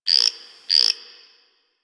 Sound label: Drifter Camp Water Creature; Ambience; One Offs; Creatures; Warframe; Quests; The New War